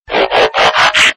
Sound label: Transform